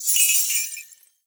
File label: Naginata Whoosh Tonal, Weapons Melee